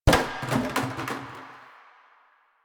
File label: Plastic Hit